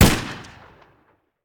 Rifle; Sound Ideas; Guns

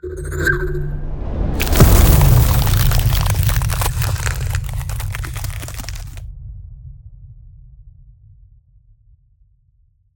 Ice Spell Damage Direct Ice Lance; Arctic Aura

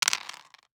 DICE on Cardboard, Throw and Roll, Mini, Six Dice; Samples; ArticulatedSounds; DICE Sfx Party (Extended)

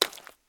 mud; firefight

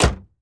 Truck Expedition Hood Close; Trucks

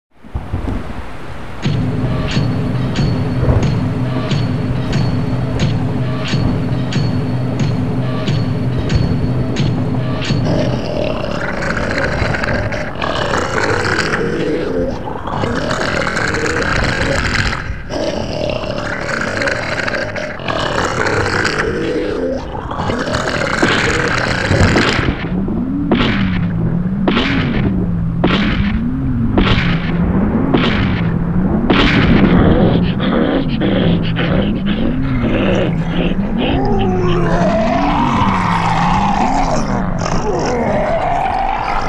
halloween sound effects monsters; Halloween Nightmare CD Sound Effects

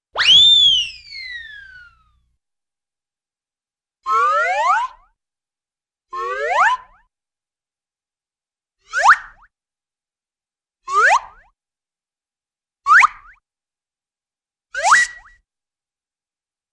Slide Whistle Sounds, Cartoon; Hollywood Edge; Cartoon Trax

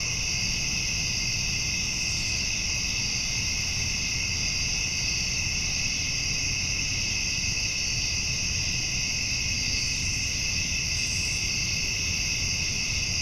nighttime forest; firefight

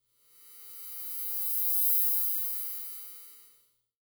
Electric Buzz By Passing Thin Electric Buzz; Hollywood Edge; sound designer's toolkit v2

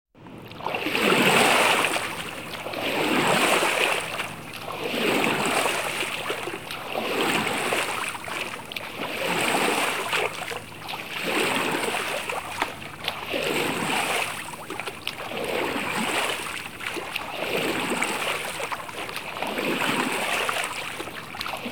Rowing General Atmosphere; KMP Film Video Sound Effects

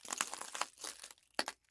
glass; Pitch Black - A Detective's Demise

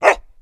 Dog Bark; Blind Drive

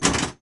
Pinball Target Pop Up

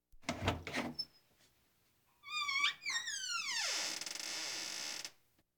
Household Phones

